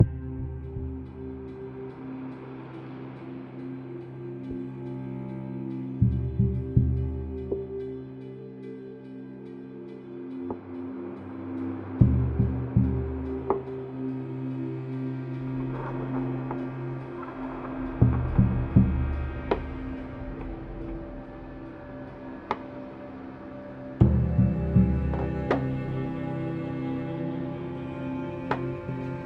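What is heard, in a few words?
music menu; Slice of Sea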